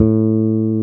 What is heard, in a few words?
BASSES, Roland